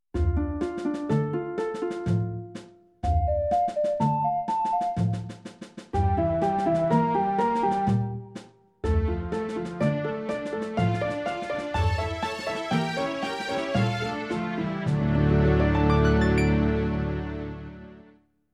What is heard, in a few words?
Ocarina; The Legend of Zelda Ocarina of Time